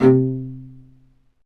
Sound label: Low Marcato; Roland L-CD702; Combos 1